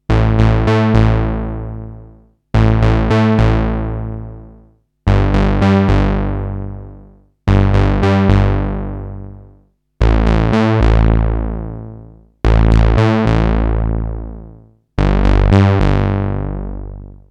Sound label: SH2 MS20